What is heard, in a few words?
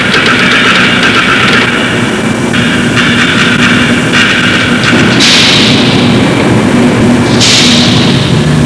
cage